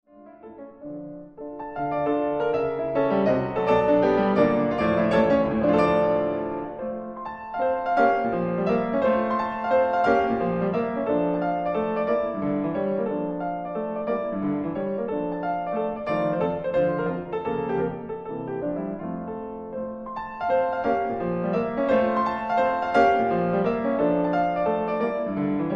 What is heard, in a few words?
Impromptu in D minor